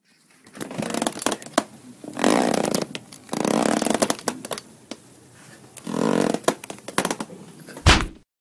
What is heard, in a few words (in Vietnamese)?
tieng keo cang soi day va dut day